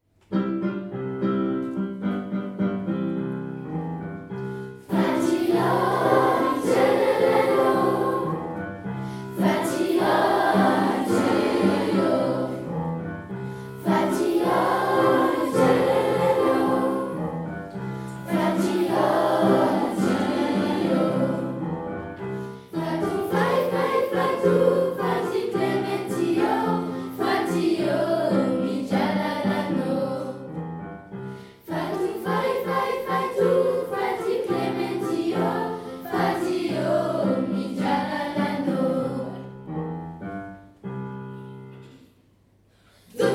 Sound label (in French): 2 voix